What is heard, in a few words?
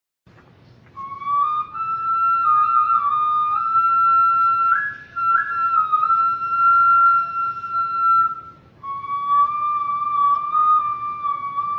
flute